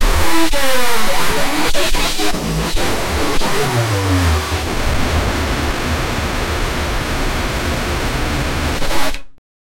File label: anxious aura, aura; quirk spinda